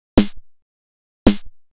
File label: Snare, Trance